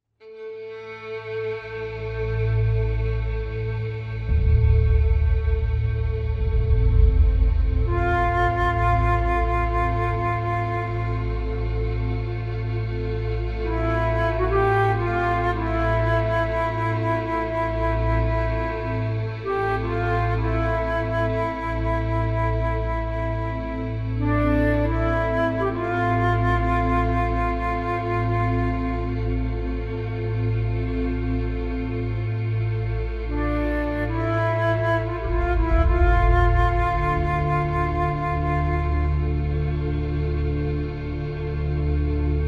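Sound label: Duduk play flute reference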